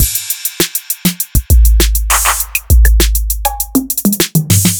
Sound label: acoustic 808s kit; by uppercussion